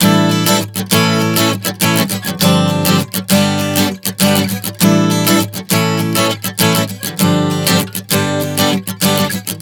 Progression 100 A-G, Acoustic Guitar, Chord Progressions 100 bpm